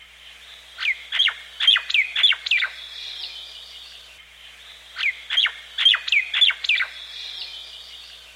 Common Myna

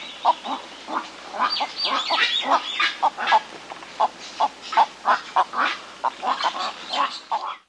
Black crowned Night Heron

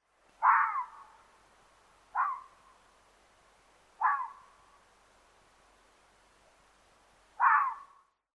red fox

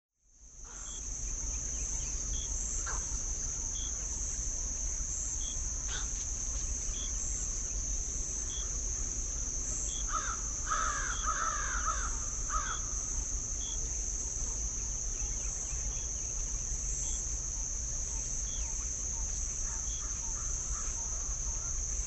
Day Chorus

